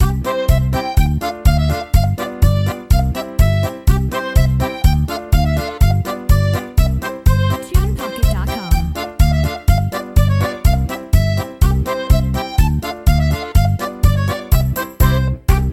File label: Lively Polka Dance; TunePocket